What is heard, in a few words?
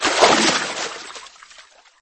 jump